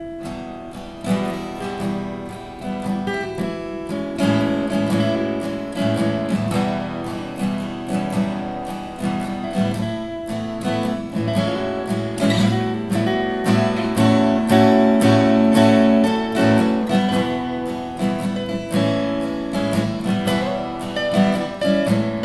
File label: guitar army